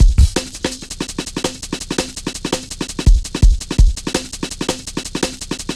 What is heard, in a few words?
Rollin Shaker, Drum Loops 166.5; Zero-G Jungle Warfare 1, 2 & 3